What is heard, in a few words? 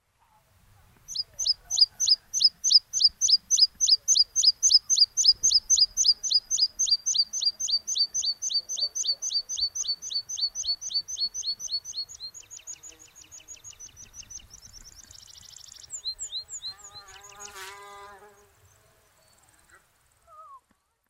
meadow pipit